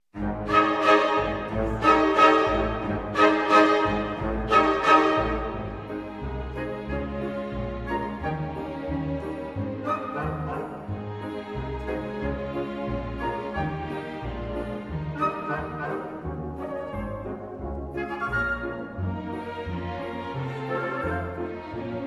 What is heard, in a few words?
Instrumental Track; NANCY